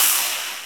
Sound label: PRC TROM, Analog Drums; Roland L-CD701